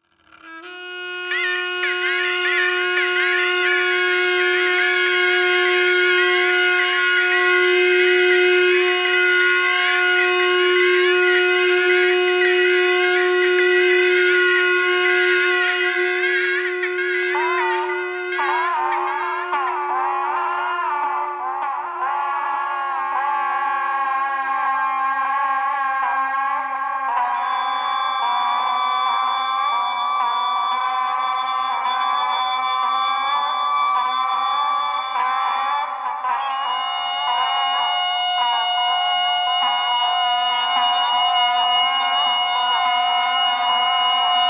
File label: bagpipe geese bagpipe thread noise